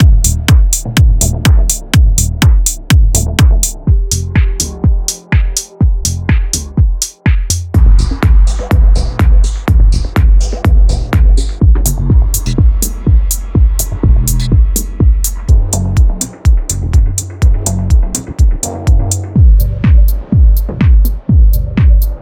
dark minimal tech; dark minimal tech vol2

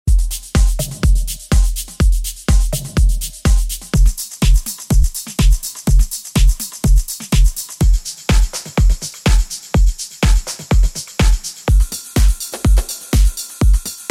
melodic techno; love hate melodic techno

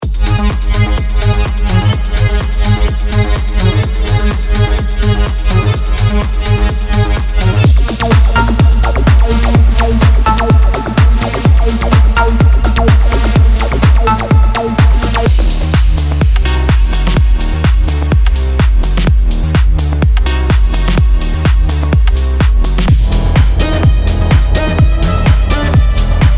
techno city

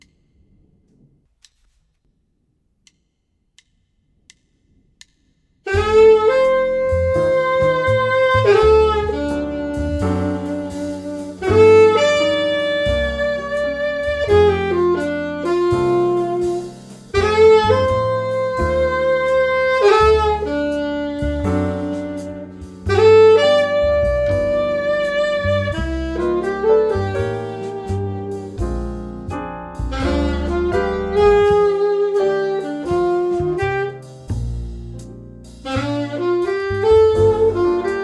alto medium swing

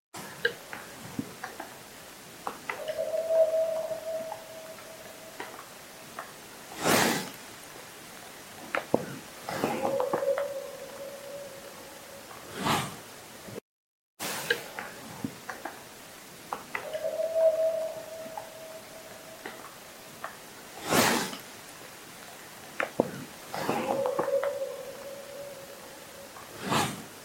bigfoot call

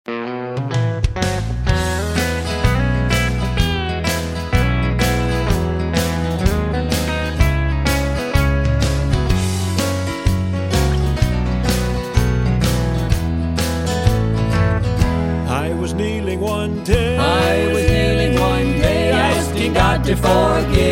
joyful sound trio

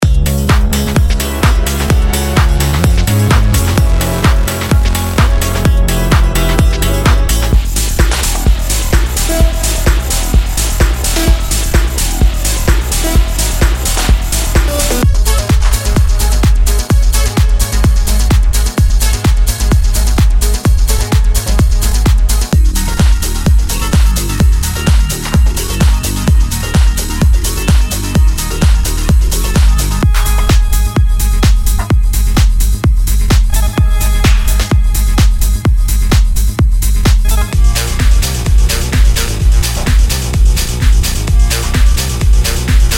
techno

